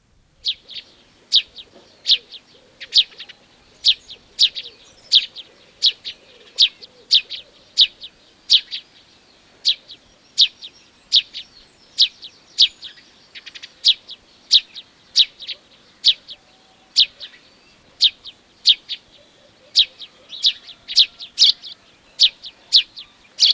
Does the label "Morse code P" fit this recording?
No